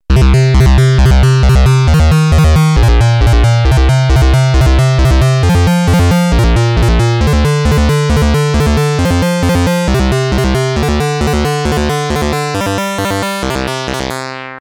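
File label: synth retrigger, Moog Minitaur; analog monophonic synthesizer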